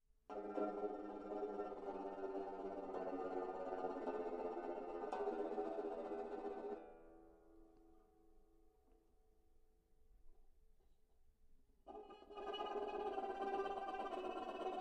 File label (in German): nageltremolo, banjo